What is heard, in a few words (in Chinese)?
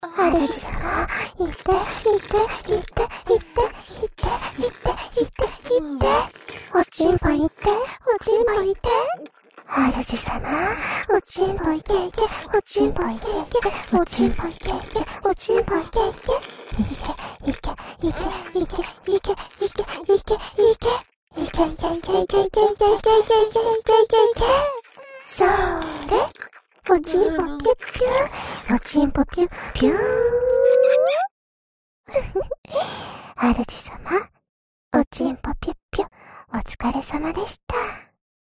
主人♪ 肉棒射射♪ 肉棒快射射～～♪ ＋ 口交; 自慰輔助用特典音聲